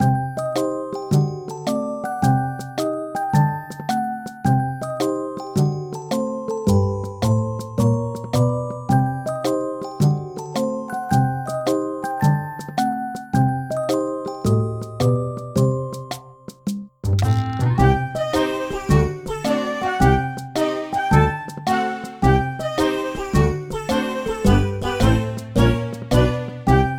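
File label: background music; magiccraft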